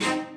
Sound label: strings